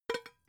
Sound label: pot lid small shake; objekte; topf